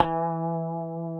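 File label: E 3A, compressed Strat, guitars; Spectrasonics; Hans Zimmer Guitars VOL-1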